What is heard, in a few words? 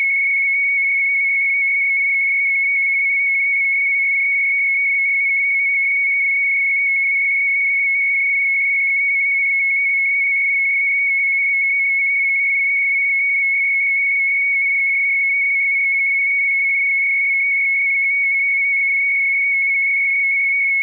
SC104 100Bd, DGPS RTCM SC104 100 Bd/50Hz MSK; also known as IALA-DGPS & MF Beacon DGPS